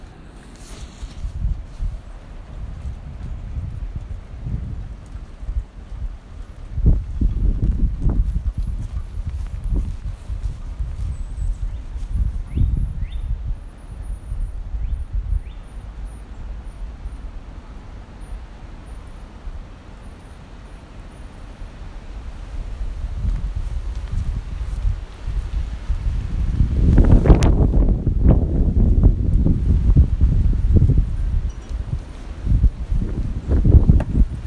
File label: Stormy night wind and birds